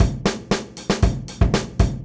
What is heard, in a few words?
117bpm; GroovePizza